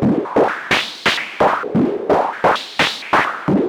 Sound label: Modular 130BPM; noise hiss crackle